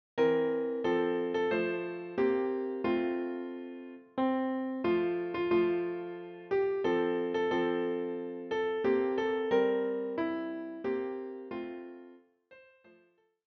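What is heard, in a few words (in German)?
O Tannenbaum Klavier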